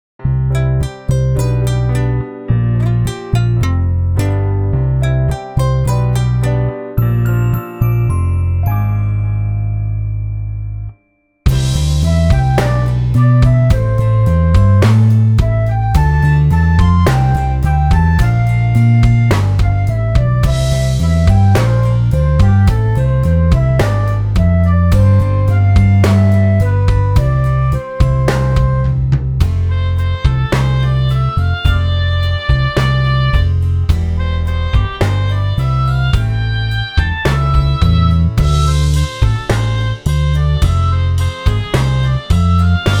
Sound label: Honobono village, bgm